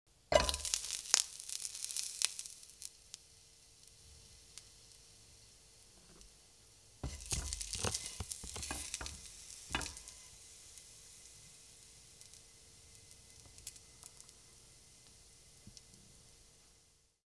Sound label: Grilling Sizzle; Cooking